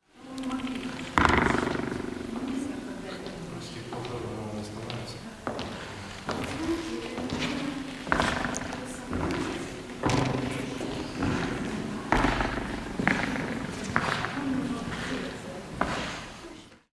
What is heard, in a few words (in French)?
Echos Ermitage